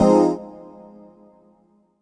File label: CHORDS; EastWest; Dance Mega Hip Hop Electro